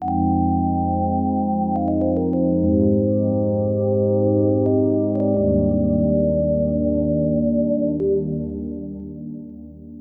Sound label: Sine Solo; Solos